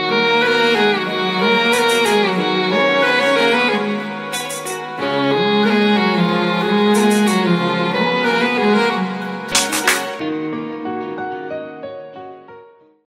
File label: bollywood music ringtone